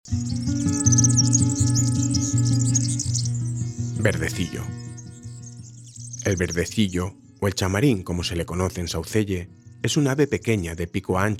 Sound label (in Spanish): verdecillo, aves; lavanderas